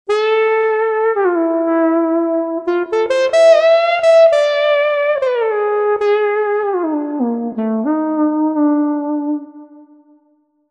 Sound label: Slinky; TURBO VL